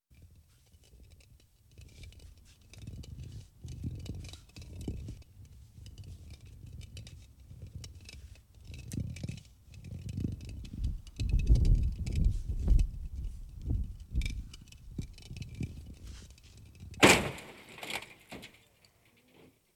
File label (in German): Katastrophen Rollende Steine Explosion